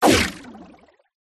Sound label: game designed water hit thump